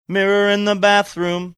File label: singing mirror in bathroom